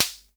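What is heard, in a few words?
SR95 snare drum; Best Service; Dance Mega Drums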